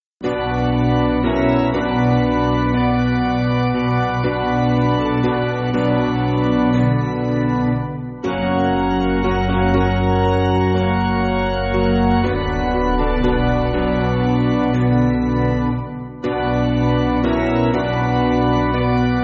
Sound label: Spiritual, Piano